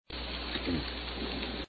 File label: fish short groan; biophony